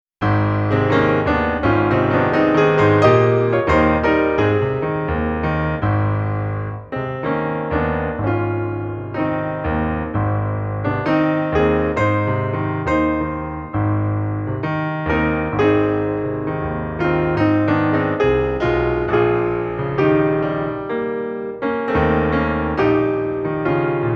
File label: solo piano